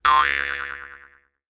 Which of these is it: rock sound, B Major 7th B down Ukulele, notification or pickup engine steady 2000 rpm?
notification